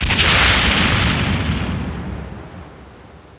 big hit; Hellboy

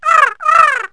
bird; Wired for Sound Pro CD; Aristosoft, Inc.

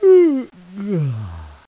YAWN

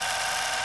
slide

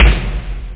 Tom Room Amb, Drums Percussion

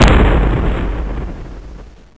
explo

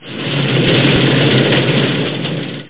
gate

